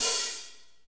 HAT, Drumscapes Roland

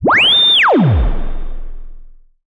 Simple OSC Spring Reverb; radiosonics; Classic Simple OSC FX

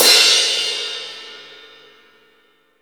Crash Cymbal, MONO CRASH

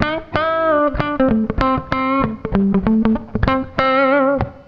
CLEAN, CLEAN GTR

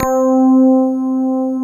FM ELP C3 L, Keyboards Old School; USB Soundscan vol.09; AKAI; FM ELP 2

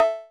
perc, Roland MC-909

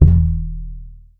TUB BASS E 1

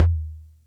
Music Percussion Computer Tom, Drum Machines

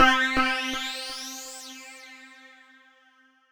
C4, SynthLine

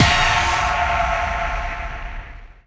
D+B

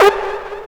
SYN, Jungle; E-Lab; XTC Files of Jungle

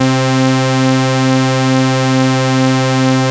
MOOG PRO, basses

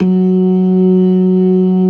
ORGAN, LOWER MANUAL

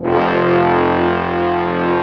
brass F2; AKAI S-Series CD-ROM Sound Library VOL-2

